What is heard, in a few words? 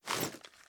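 pistol 1911 reload empty lift; gmod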